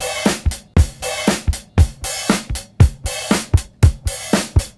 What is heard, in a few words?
CLAV BEAT; USB Soundscan vol.32; Roots Disco; AKAI